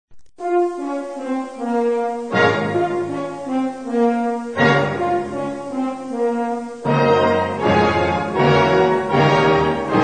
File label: horn